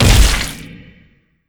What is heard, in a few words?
death burst large